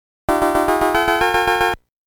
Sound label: Ghost - Speed Down; pac pal 1983